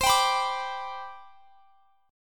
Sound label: C Major 7th Suspended 2nd C down, chord; CM7sus2 strummed